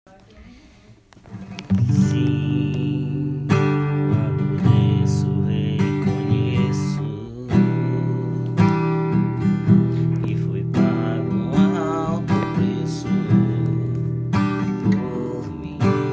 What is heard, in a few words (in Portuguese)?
voz e violao